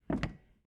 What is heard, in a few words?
door light bangs